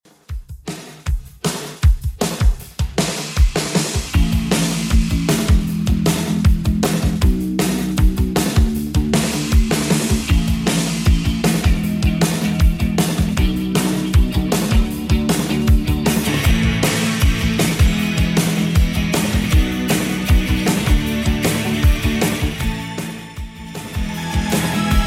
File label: 80s Rock 156 bpm